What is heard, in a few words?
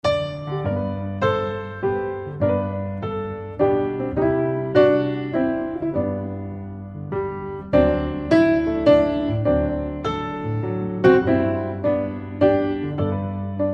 Away In a Manger Upbeat; Trad (PD)